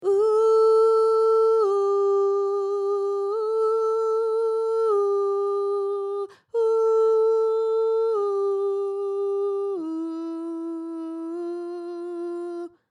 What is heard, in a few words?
Jazz Gloria Ooh Soprano